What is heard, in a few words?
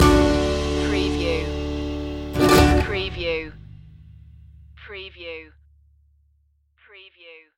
chant stinger